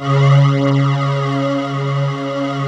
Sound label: VOXY PAD C3 R, KORG, Analog; USB Soundscan vol.49; Analog Fantasies; AKAI